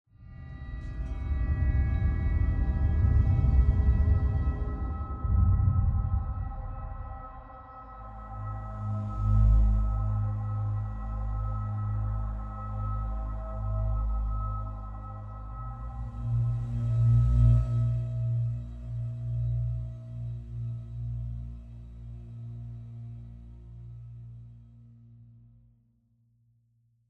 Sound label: Dark Scary Explore Mood